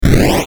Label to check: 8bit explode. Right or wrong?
Right